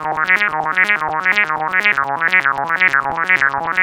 wag the dog Eb 125, synth loop; Zero-G; Soundclash; Nu Dance Electro

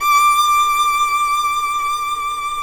FIDDLE NOTES, D6, L

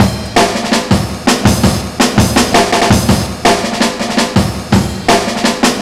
165 solo, Drum Loops, Drum & Bass; 90 s Sample CDs; Total Drum&Bass

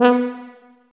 TUBA, Tuba stacc; Roland L-CDX-03 Disk 2

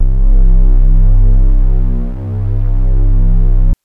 Synth TrianglePad c0; TrashLibrary

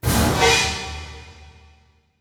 HIT, orchestral; Orchestral Loops; FULL ORCH ACNT

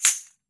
JINGLE CLAP, JINGLE BELL; AKAI S-Series CD-ROM Sound Library VOL-5

